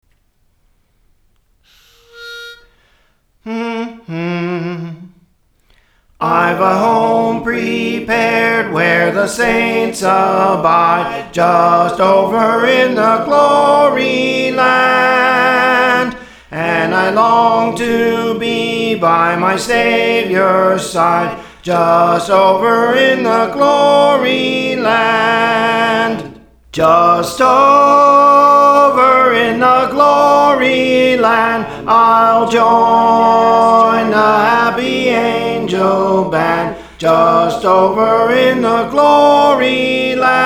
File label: Soprano, Songs; Praise For The Lord Training; Just Over in the Gloryland